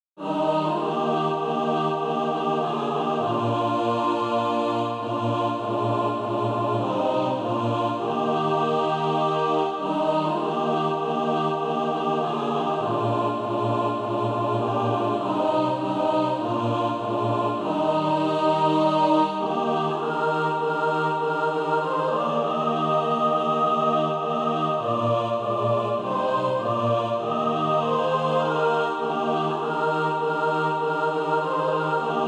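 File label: mixed, Practise Music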